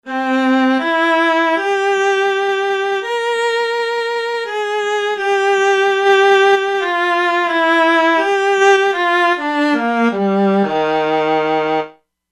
Solo Viola, E-mu Proteus-2; Factory Presets

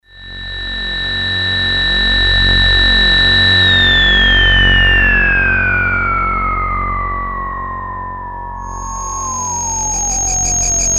Prophet-5; Sequential Circuits Prophet-5 (Ver.3); Factory Patch; 44 The Landing; File 3 Programs

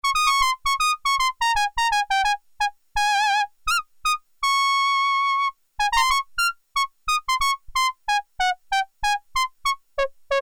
Piccolo Trumpet, Korg Poly-61M

